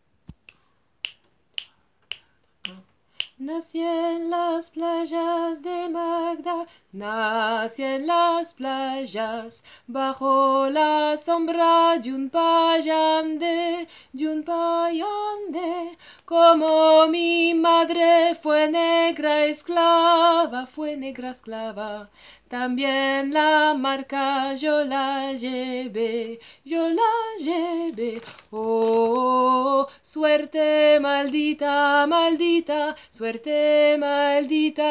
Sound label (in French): voix basse